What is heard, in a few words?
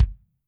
KICK, Drums Percussion